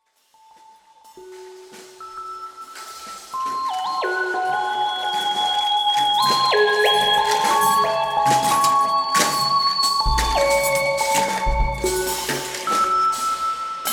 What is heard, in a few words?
Watery Lead with Cow Necklace